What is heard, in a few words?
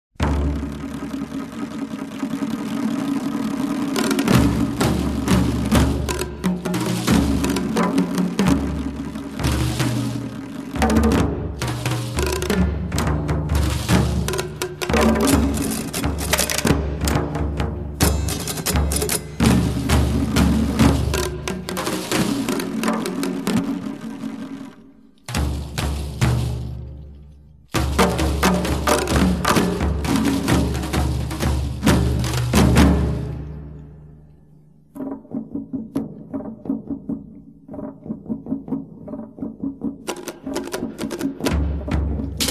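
Rhythm for percussion instruments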